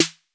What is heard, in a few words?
808 SD; 90 sSampleCDs; Crypton - DrumBase5000; DR55+110+220